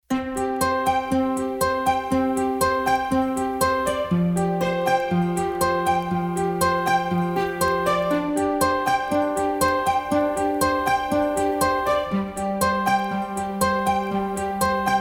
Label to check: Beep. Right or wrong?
Wrong